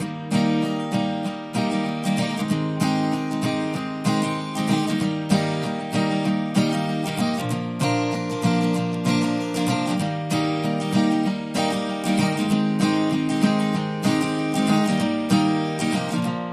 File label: No Drums No Bass